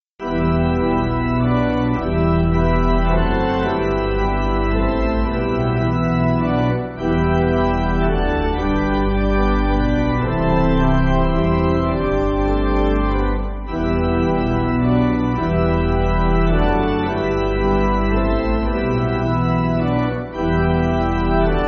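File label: Pipe Organ, Backing Files for Churches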